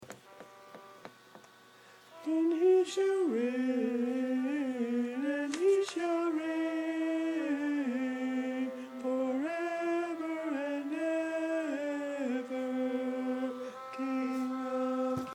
tenor

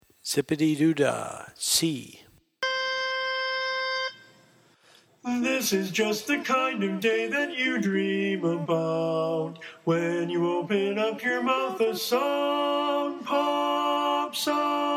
Lead

